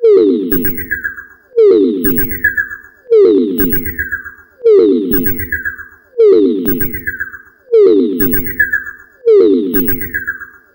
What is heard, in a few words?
alarm